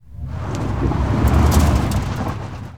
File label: Auto Tires Peelout Dirt; Automobiles